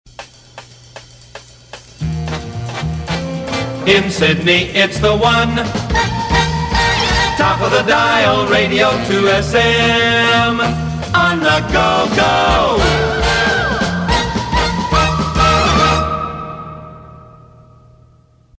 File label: Swing a Nova; 2SM; PAMS